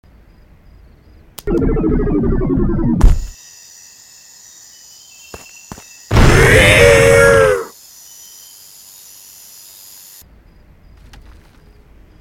Shrink Ray; Stealing The Diamond

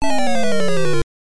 Power Down; Exed Exes; NES

